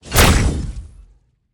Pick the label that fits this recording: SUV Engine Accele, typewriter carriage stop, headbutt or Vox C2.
headbutt